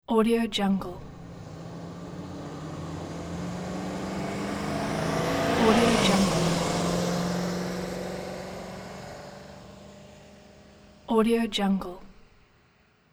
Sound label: car passing by